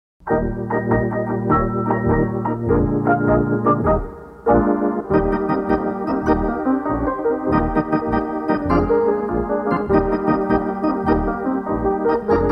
instrumental

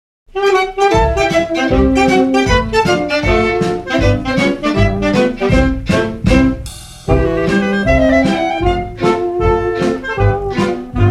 schottische cowboy schottische instrumental

